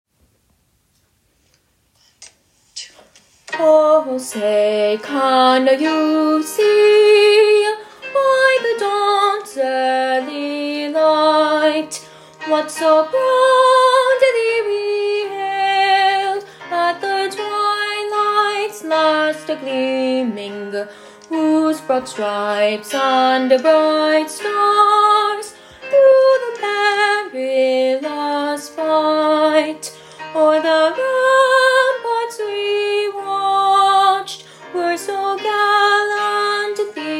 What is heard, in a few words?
soprano part star spangled banner national anthem soprano; medfordcommunitychorale